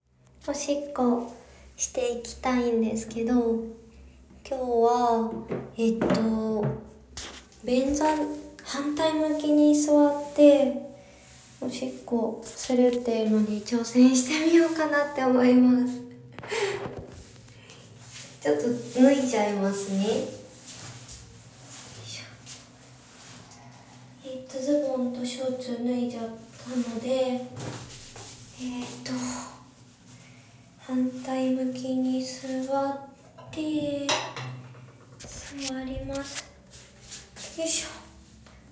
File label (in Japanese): オナラ出ちゃったッ！！！おトイレで！反対向きに座って！オシッコですッ！